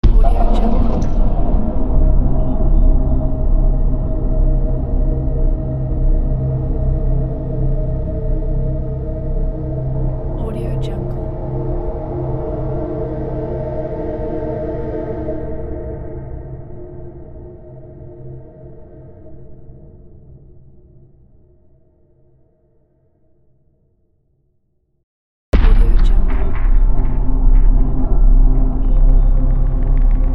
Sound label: long cinematic grim impacts